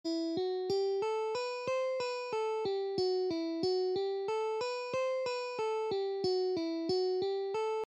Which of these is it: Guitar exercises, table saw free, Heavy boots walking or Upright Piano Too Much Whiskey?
Guitar exercises